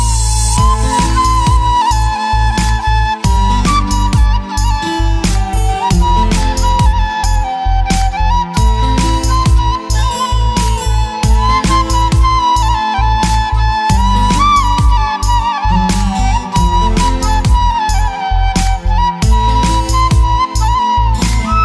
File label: Flute Ringtone